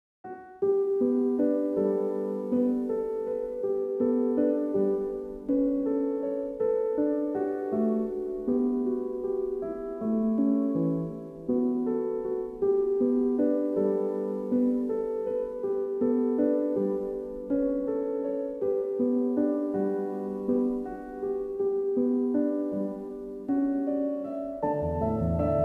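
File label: Peaceful Piano Relajación Piano Mellow Waves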